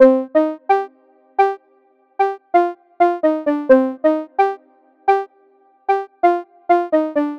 BEAT MONTAGEM

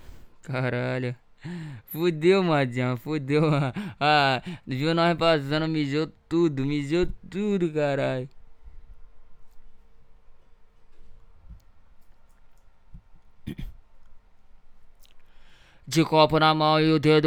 ACAPELLA

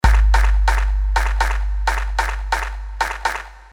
Beat Aplauso

